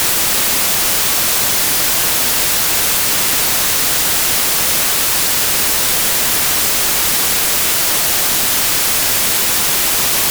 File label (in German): Rauschen